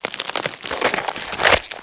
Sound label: egg hatch